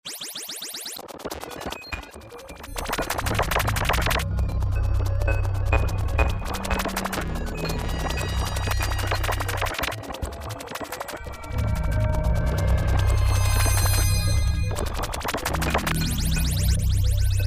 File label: Hectic Tones